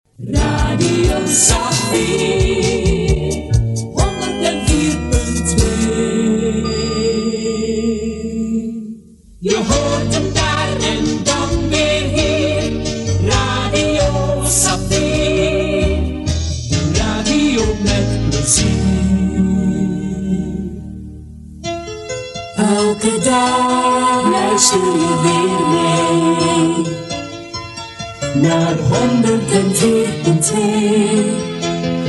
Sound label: jingles; Saffier Wuustwezel